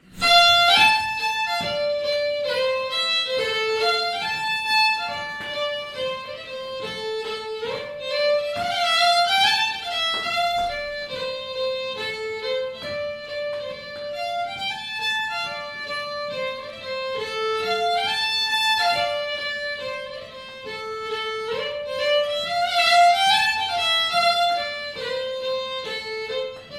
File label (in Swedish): Schottis från Småland